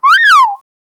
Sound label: cartoon metal whistle